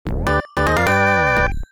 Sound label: Bonus Start; Galaga '88